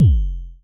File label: FM Kicks F1, Vermona Kick Lancet; LookIMadeAThing Kicks